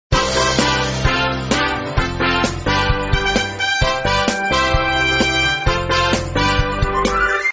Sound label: Reward Music; super why the power to read